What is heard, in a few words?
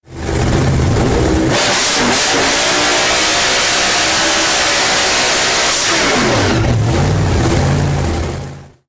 ringtone